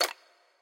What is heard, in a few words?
click; Rescue Team3